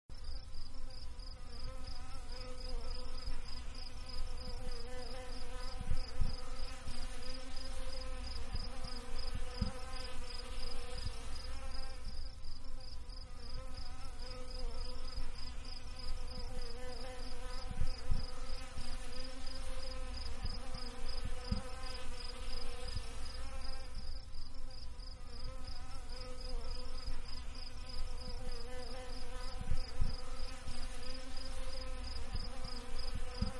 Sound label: Bees-Buzzing-Crickets In Back Ground; Animals; Manhattan Production Music